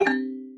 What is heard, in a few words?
plinko